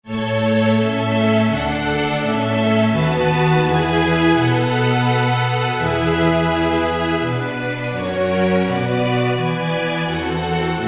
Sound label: string orchester